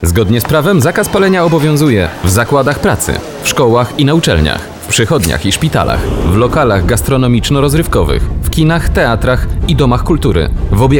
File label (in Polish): radio; kamp wolne miasta